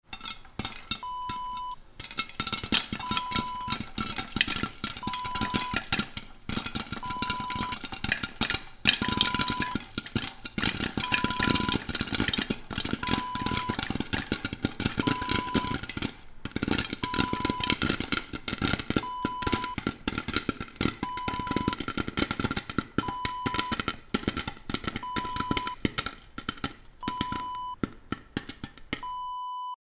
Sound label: pop corn popping